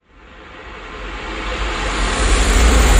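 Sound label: Reverse Rocket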